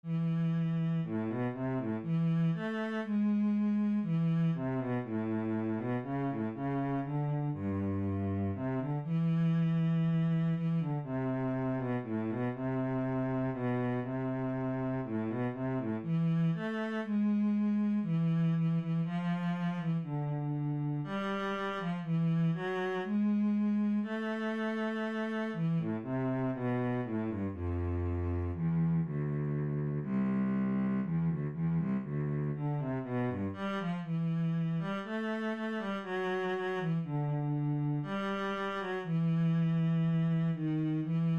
Fantasia cello

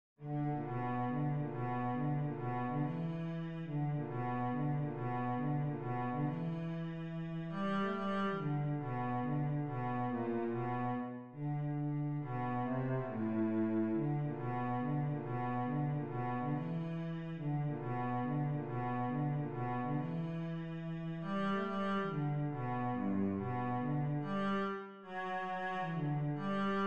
ragtime cello

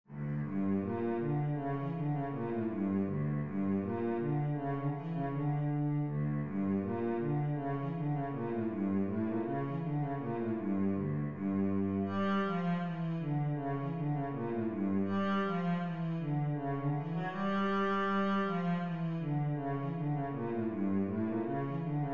hasapikos cello

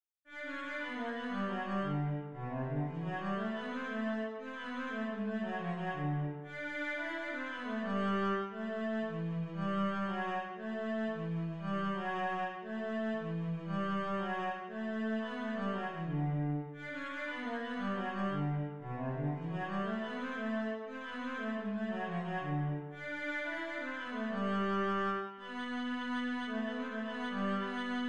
jarabe cello

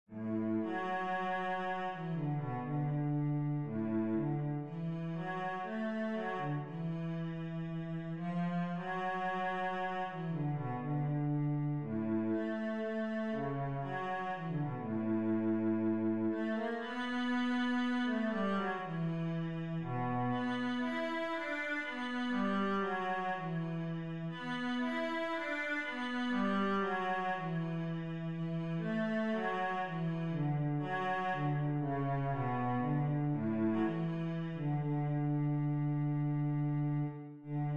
concerto b minor cello

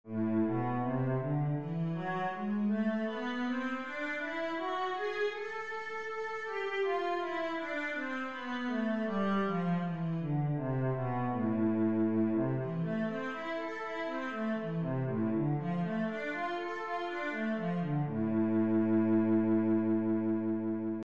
cello, a minor

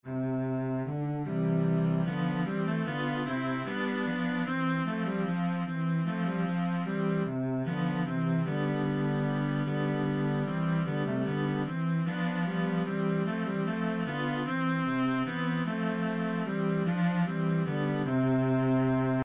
minuet, cello

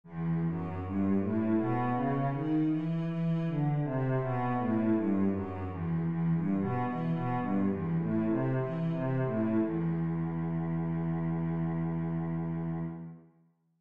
cello E minor scale

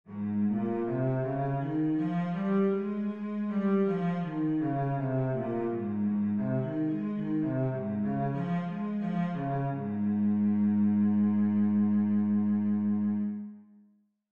a flat major, scales, cello

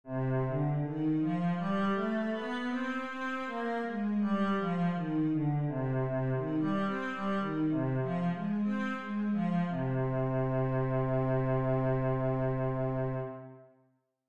cello C minor scale

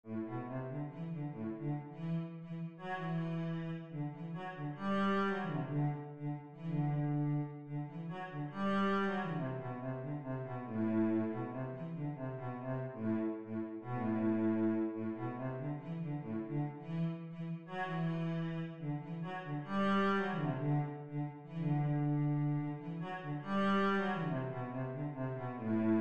braul cello

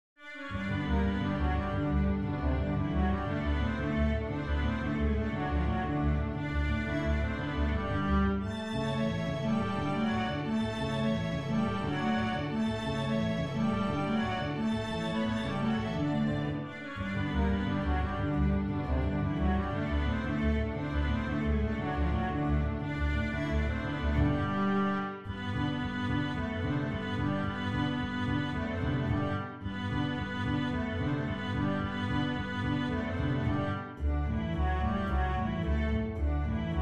jarabe, cello

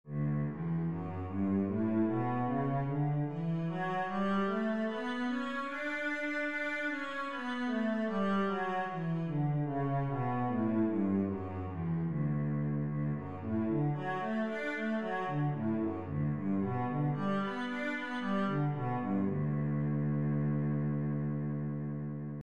d major, cello

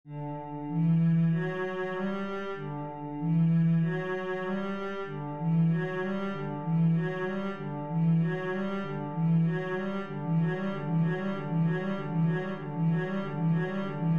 etude, cello

